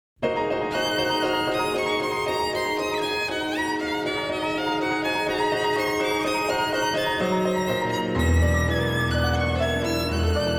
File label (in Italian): Concerto Violino e Pianoforte